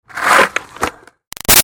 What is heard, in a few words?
Skateboard Curb Crash Scrape; Skateboarding; Sports; Big Room Sound